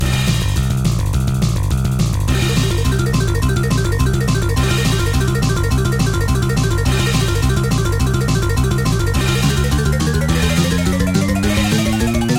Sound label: Relaunch Hurry Up; Star Wars Data East Pinball